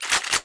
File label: gun pump action